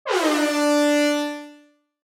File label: Reggae air horn D3